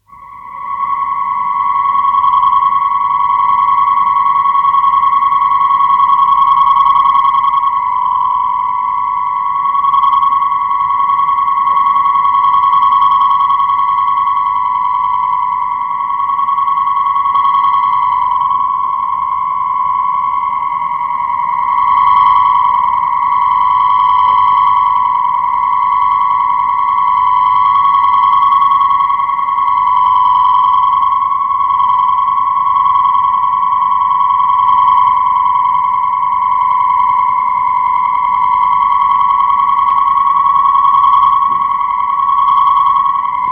Warble Frequency Notes, 384 Hz (Approximate frequency); Deep Tone; BBC